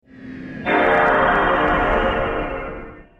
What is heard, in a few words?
new ps2 ps1 disc startup